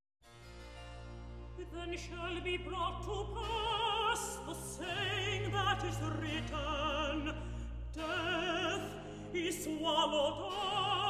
Toronto Symphony Orchestra; Messiah - Handel

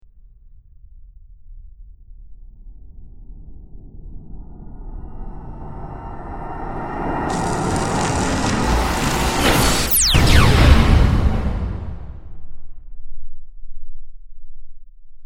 Warp One

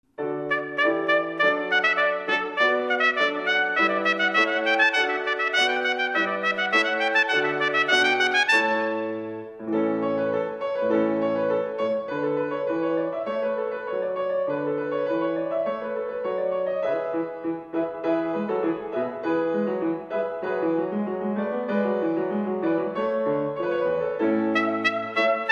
Concerto for Trumpet in D - Allegro; Telemann; Solo Trumpet

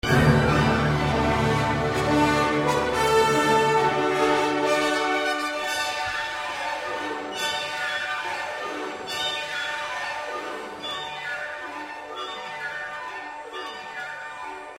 Orchestral; Chicago Symphony Orchestra; Richard Strauss; Eine Alpensinfonie - Am Wasserfall (At the waterfall)

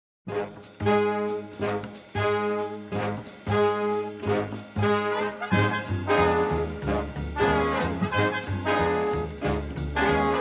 Jazz Ensemble

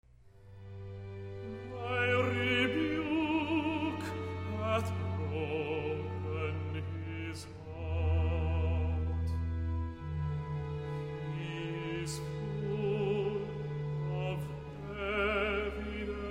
Tenor Recitative, Handel Messiah; Part II - The rebuke hath broken His heart; Boston Baroque

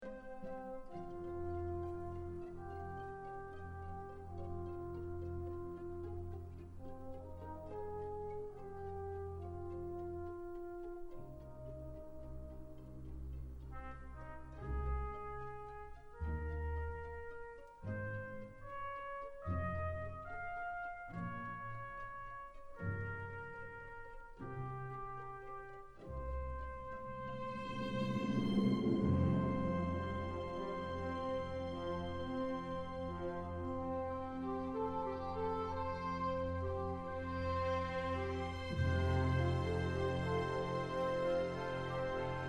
Trombone; V - Im Tempo des Scherzo; London Symphony Orchestra; Mahler Symphony No. 2

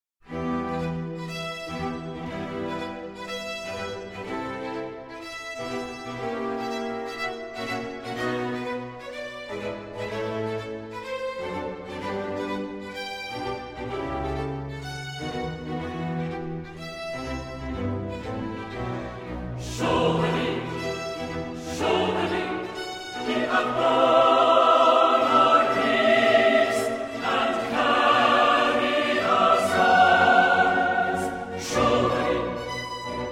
Surely he hath borne griefs - Chorus; Boston Baroque; Handel Messiah

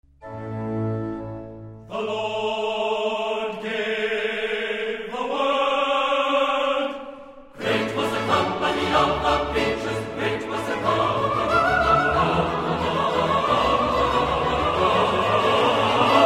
The Lord gave the word - Chorus; Boston Baroque; Handel Messiah

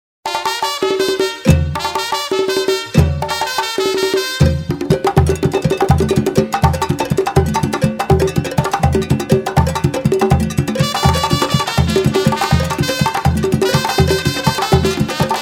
Conga, Jazz

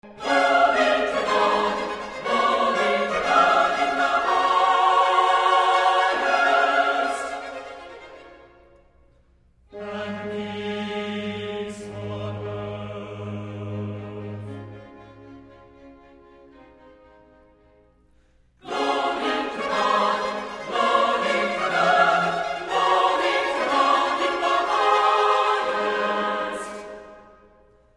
Glory to God - Chorus; Boston Baroque; Handel Messiah

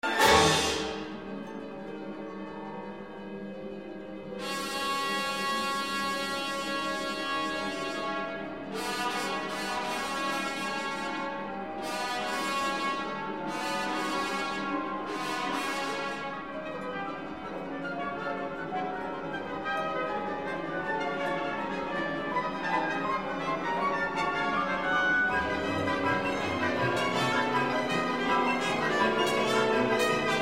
Magic carillon, Orchestral; London Symphony Orchestra; Stravinsky - The Great Ballets; L'Oiseau de feu

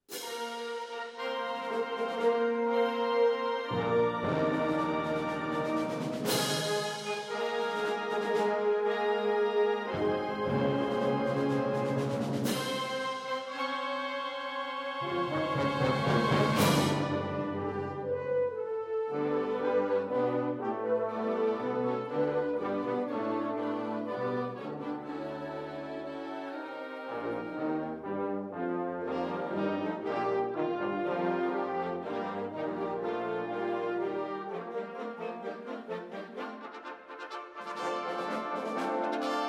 Wind Ensemble; Prelude, Siciliano, and Rondo (Arnold) - I

